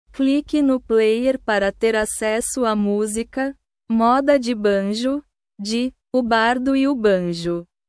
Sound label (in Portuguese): moda de banjo